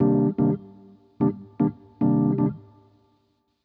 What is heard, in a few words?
E. Piano